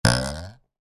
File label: Game jump boing